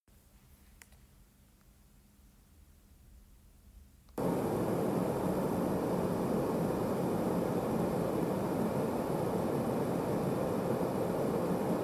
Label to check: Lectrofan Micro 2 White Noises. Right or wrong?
Right